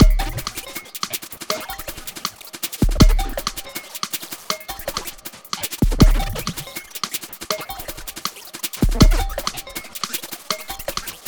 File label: Quantum, Processed Percussion